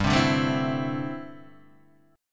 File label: D 7th Suspended 2nd Suspended 4th, G